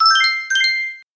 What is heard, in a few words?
sms signal, polifonia midi